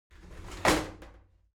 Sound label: object bathroom shower slide doors open heavy